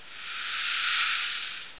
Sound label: warp; Active Worlds